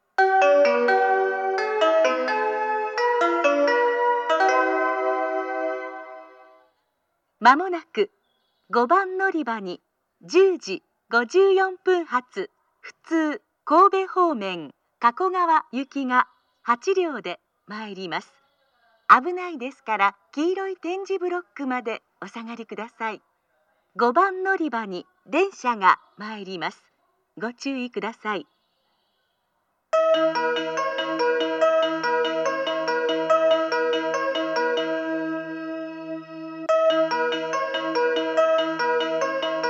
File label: local, kyoto station; kakogawa